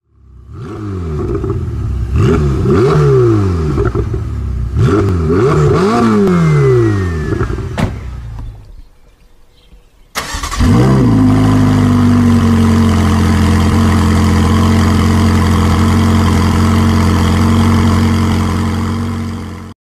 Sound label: Brilliance, موتور برلیانس